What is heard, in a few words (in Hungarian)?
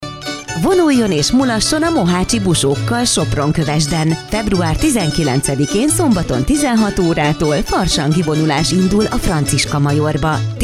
farsang buso; sopronkovesd